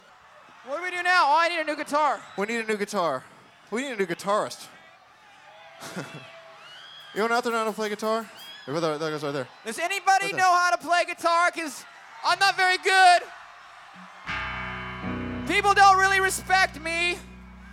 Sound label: New Guitar